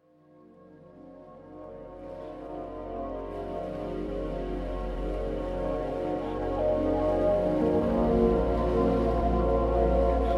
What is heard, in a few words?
432 Hz; The Abundance Pyramid; Retrain Limiting Beliefs; AstralHQ